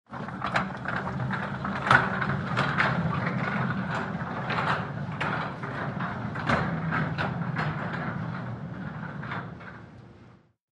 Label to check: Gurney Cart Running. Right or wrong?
Right